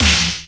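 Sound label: cymbal cymbal FX, Analog Drums; Roland - Rhythm Section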